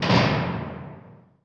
brick down

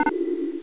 eject player; Game Voice; HawkChrome

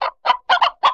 Chicken

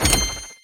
Crate Pickup; Worms3D

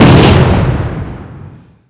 Explode; Worm; Worms3D

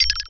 Get Diamond

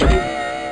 big door

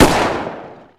Shot Gun Fire; Worms3D

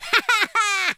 laugh; Madchester

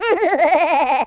laugh, Alien; Worms3D

